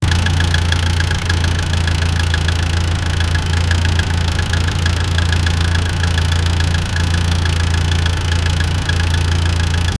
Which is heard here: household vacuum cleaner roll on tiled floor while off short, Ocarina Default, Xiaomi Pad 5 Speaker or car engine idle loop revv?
car engine idle loop revv